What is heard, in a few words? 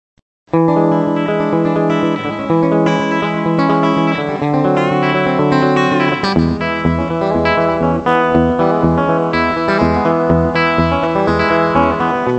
e7 swing, guitars